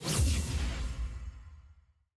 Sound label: button ready; new player experience